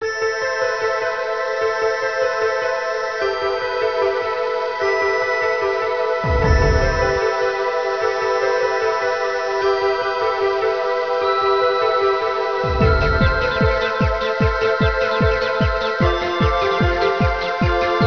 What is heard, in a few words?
Future Ambience